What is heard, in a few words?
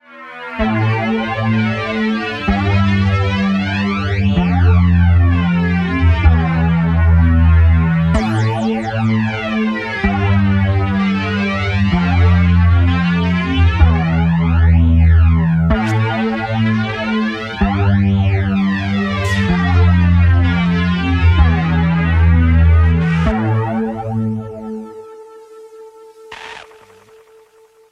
interlude